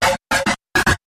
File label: Hard Ho Hum